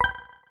StopWatchBegin; LeEco Le 2

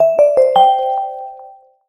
Boiling, notifications; Honor Magic 8 Pro

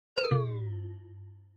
sent failure; ZTE Nubia Z5S Mini

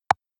Tick, ui; FCNT Arrows-Alpha

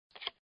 Unlock, ui

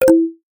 Video Stop, ui; INOI 1 Lite

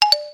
Keypress Invalid; Gigaset GS290

